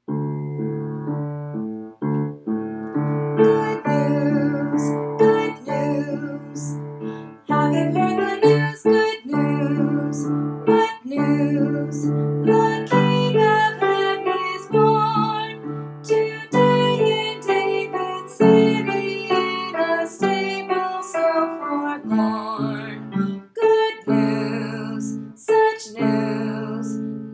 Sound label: shepherds song